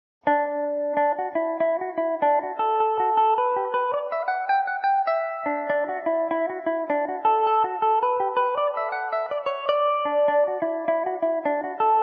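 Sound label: D major, Irish mandolin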